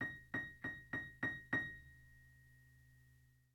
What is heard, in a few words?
Theme Fm Bb; DeadFright